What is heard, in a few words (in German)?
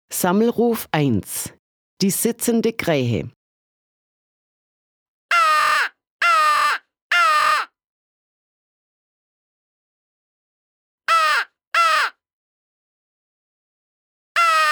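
Sammelruf 1 Die Sitzende Krähe; kraaienlokfluit; rottumtaler wildlokkers; rottumtaler kraaienlokfluit en eendenlokker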